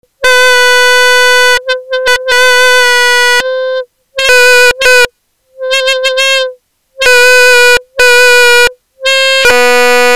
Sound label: digital woodwind funny